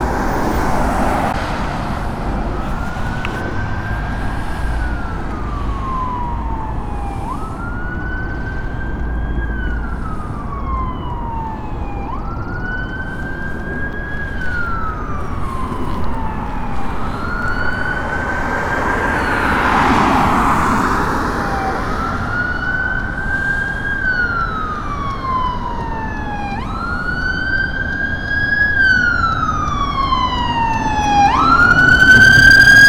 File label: sirens; Coruscate